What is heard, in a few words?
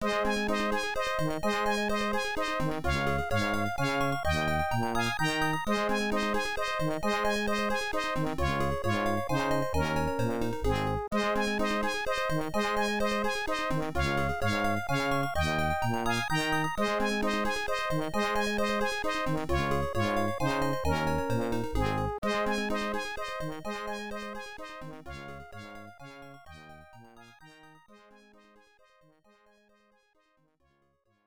Bet Screen